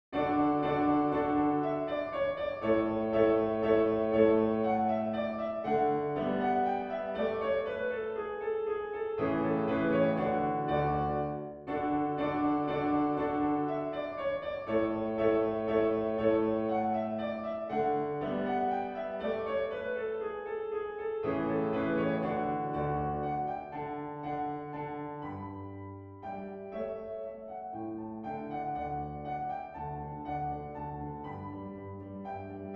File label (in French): danse allemande, ré majeur; haydn joseph